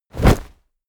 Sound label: bodydrop textile